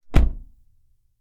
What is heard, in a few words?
Car Door; 環境音